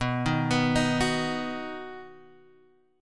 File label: B Major B, Guitar, Half Step Down